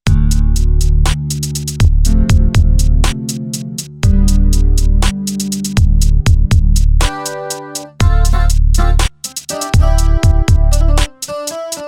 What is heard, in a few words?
Hip Ballad